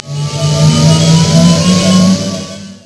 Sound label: ammopk